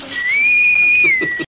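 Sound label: intercom; trek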